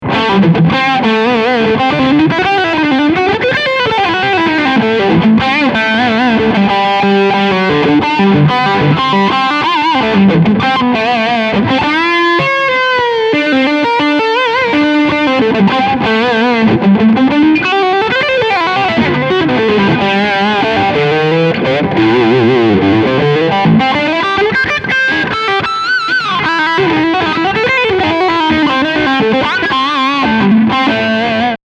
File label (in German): Steg HB Leadsound